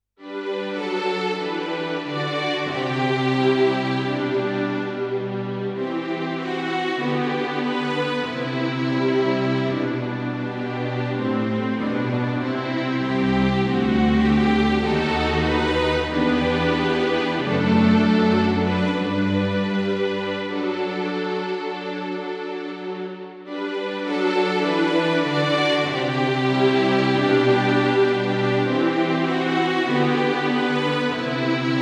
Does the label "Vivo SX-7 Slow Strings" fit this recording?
Yes